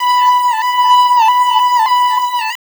Keyboard; Sonic Foundry (Sony Creative Software); Infinite Soul VOL-2; Keys

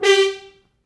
Horns stops

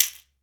Latin Shakers, mexican

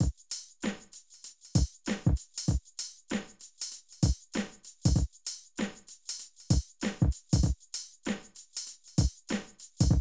Drum loops, Streetsoul

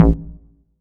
Moog Bass; Big Fish Audio; Old Skool House; OSH Bass

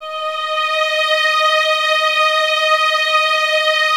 VS D#5, Violins, String Ensembles; Miroslav Vitous; 11 Violins

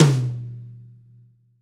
Pop Tom, Roland BK-5

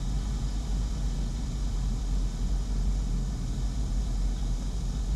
Ambience Loop, outdoor

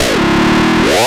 TOUCH DWN, Anime Sound FX; COMIC

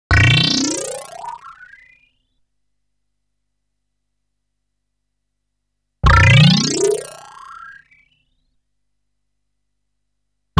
Creacky, Crispy Tones Sweeping Up; Bizarre Planet

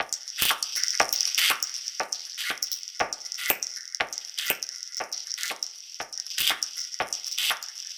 Structured Rhythm, IDM Rhythms; Sonic Foundry (Sony Creative Software); Twine-IDM

